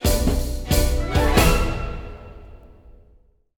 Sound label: Event Fanfare; game rip; lego worlds monster pack